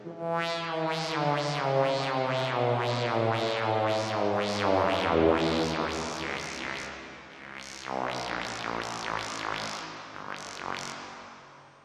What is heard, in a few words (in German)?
filterspiel, Trautonium